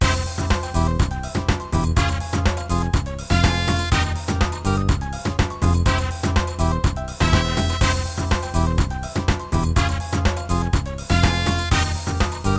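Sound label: batucada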